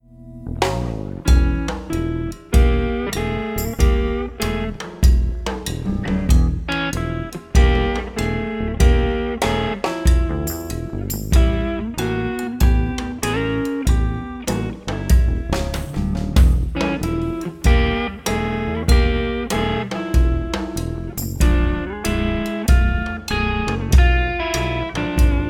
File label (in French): Petit coup de blues